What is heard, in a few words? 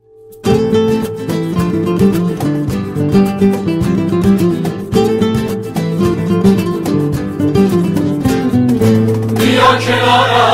Guitar